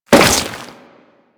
Crewman Step Flesh, Footsteps; Warframe; Corpus

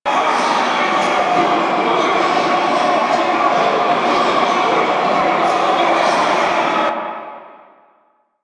Army Loop; Warframe; Warframes; Hoplite

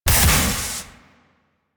Mech Jump Boost Stop; Nechro Mech; Vehicles

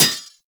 Melee Sword Impact Generic

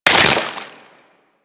Melee Impact Wood; Warframe; Weapons

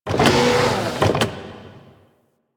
Servo Flutter; Ambience; Warframe; Corpus Railjack

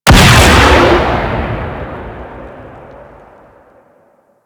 Gas Turret Fire; Ambience; Props; Warframe; Corpus Gas City

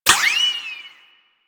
ricochet; Warframe; Cowgirl

